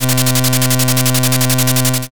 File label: slot spin